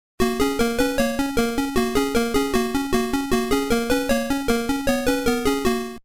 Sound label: song Level Complete; Frogger; Arcade